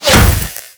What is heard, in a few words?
Glass Unbreakable Impact